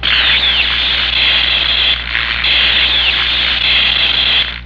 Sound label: Electric; Twin Peaks